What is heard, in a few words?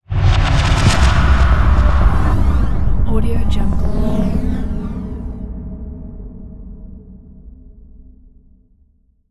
bloody nightmare zenith stingers; soundmorph